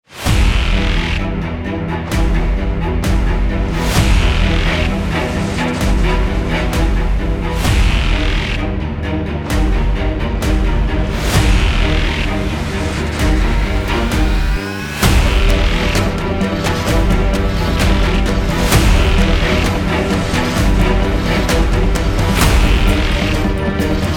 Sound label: active horror trailer